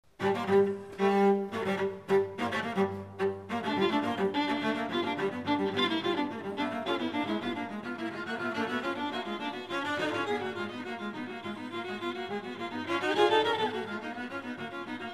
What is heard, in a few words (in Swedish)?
duetter för violin och cello